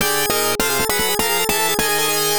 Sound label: HEX Battle Lose; Taikou Risshiden; FM Towns